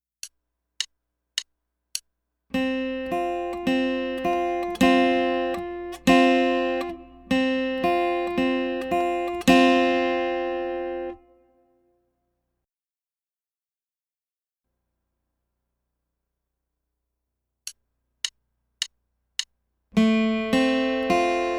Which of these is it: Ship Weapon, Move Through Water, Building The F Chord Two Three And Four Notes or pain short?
Building The F Chord Two Three And Four Notes